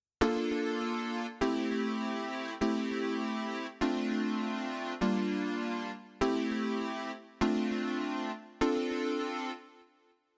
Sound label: Synth